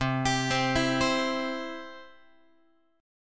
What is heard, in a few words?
C Major, Guitar; arpeggiated; JGuitar